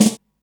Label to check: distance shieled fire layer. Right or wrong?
Wrong